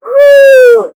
a deer dying from attack; hunting

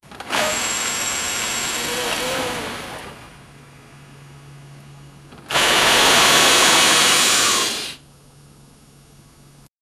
airbag deployment

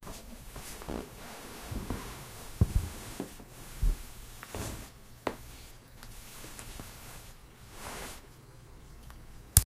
a person sitting down on, sofa

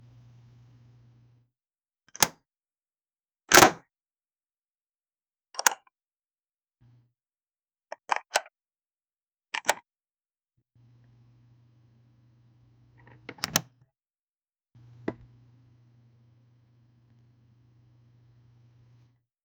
modern short and pleasant, windows

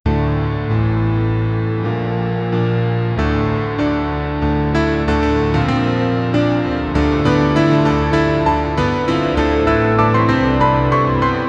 create a theme song with, theme

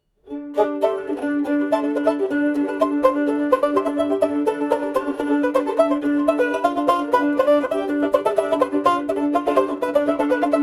warm rustic steady rhythm, banjo

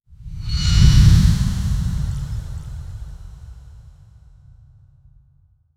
sound design, cinematic impact

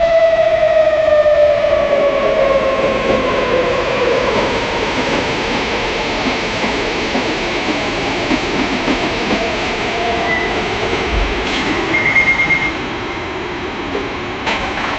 a subway incoming, arrival

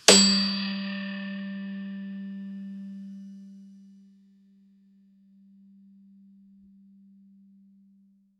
solid antique woo

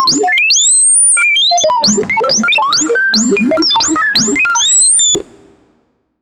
a winning sound which wil, victory

